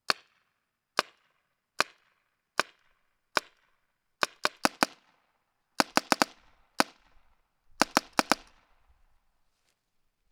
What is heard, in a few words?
spyder short barrel, guns; spyder se